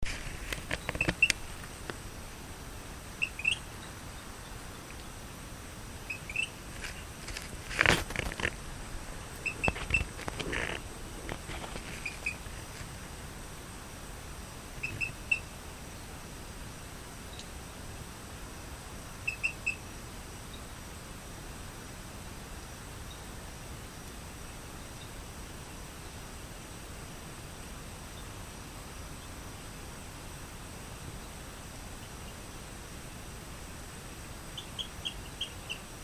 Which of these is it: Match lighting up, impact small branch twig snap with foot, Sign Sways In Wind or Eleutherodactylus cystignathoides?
Eleutherodactylus cystignathoides